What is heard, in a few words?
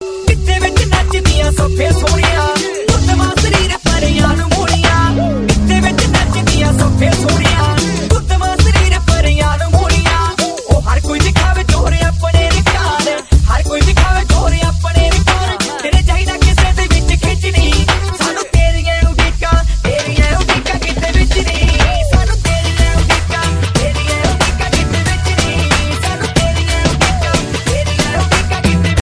punjabi ringtone